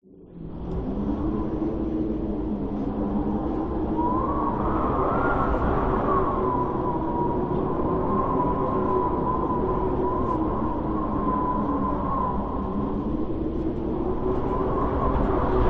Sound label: nature wind strong howling internal